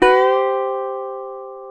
GTR, Dobros, National

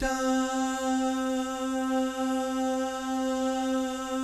DAH, jazz FX, mix guy gal; Spectrasonics; Vocal Planet

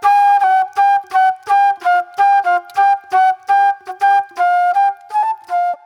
Mellow Soul Flute

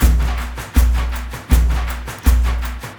AF TRANCE; Spectrasonics; Heart of Africa 2

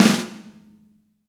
XV Snare, Roland XV-5080

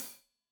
Hat Loose, Hi-Hat, Cymbals Stereo

